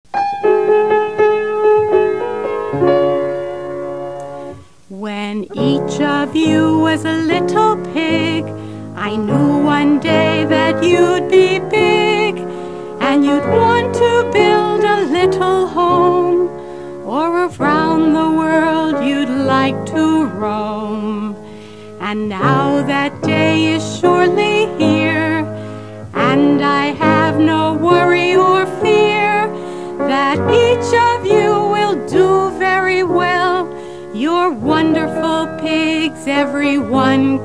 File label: Mother Pigs Song - Vocal